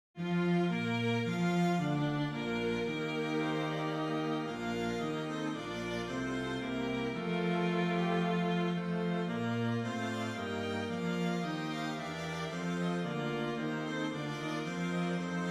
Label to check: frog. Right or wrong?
Wrong